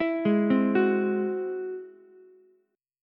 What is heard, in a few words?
Ab Augmented 7th Ab down Ukulele; arpeggiated; Ab+7; JGuitar